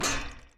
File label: Mechbull Gears - Clank